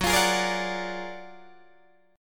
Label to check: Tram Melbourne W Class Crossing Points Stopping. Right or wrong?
Wrong